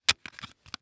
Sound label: Technology Electronic CD Case Close